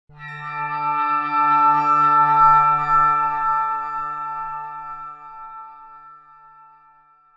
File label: SH101 Tone (FX); SH101 Tones; SYNTH KEYS